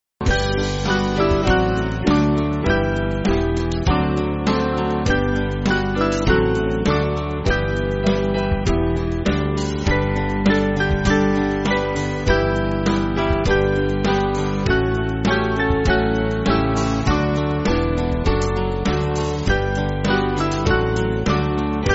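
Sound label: Music Backing Files for Churches; Wind Of The North; Orchid Bulb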